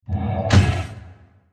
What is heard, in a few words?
Moving Hit; Crescendos; Impacts; Crafted Signals